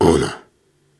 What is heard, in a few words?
Syllables and Imaginary Words, Viking Vocal, Hard